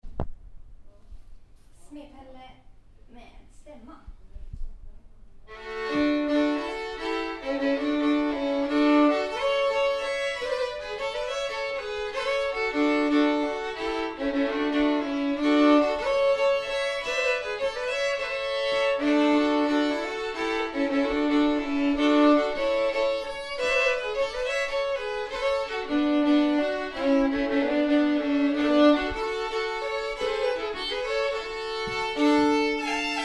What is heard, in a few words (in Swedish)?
Polska, Jarvso; Polska efter Smed-Pelle